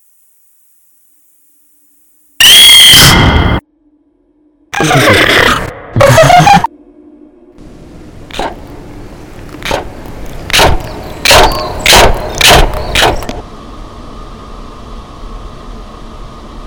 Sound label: scream avec laugh soundscape